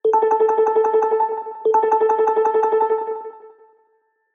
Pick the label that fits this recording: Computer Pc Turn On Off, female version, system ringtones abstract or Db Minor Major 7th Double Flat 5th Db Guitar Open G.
system ringtones abstract